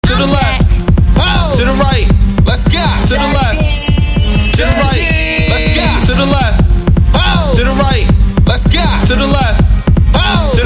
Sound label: Party Song